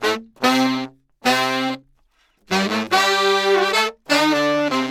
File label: saxes trombones